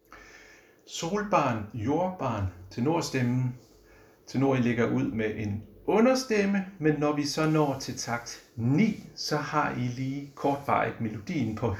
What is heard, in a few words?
tenor; harlev koret